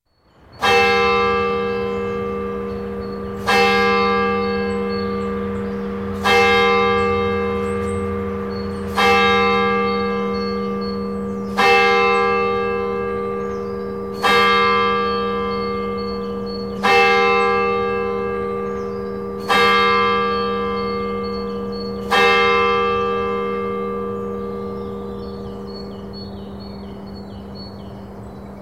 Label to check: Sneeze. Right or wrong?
Wrong